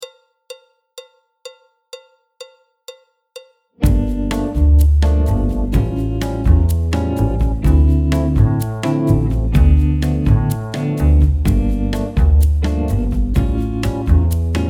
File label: flute; Jazz Syllabus Level 1